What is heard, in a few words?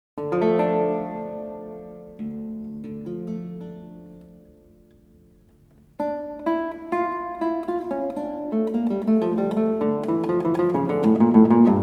Toccata; Piccinini